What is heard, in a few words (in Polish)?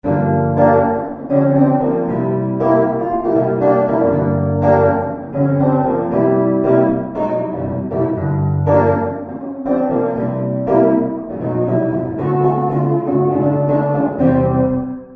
sala git